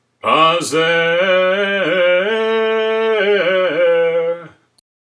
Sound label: Haftarah Pazer, trope; sinai